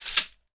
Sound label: TOOTH PICK, HANDY PERCUS; AKAI S-Series CD-ROM Sound Library VOL-5